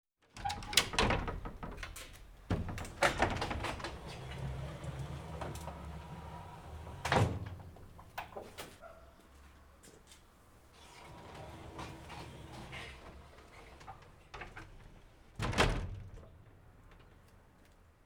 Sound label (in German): Garagentor